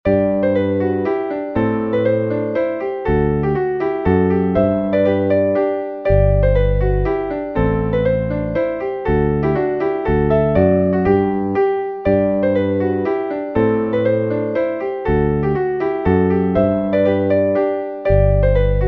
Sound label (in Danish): Mazurka